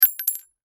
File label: Bullet Shell; Bullet Shell 3030 Drop Cement; Warfare; Blastwave